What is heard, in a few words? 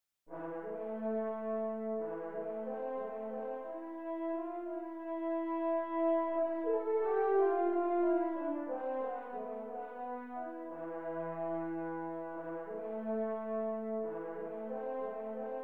French Horn